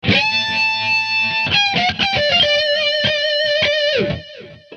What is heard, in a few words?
D 100-120 solo